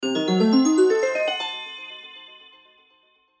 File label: Harp Glock Ascend, Harp Glockenspiel Ascend Mysterious; Strings; Blastwave